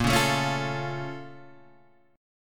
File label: A sharp Minor Major 7th, Guitar Open G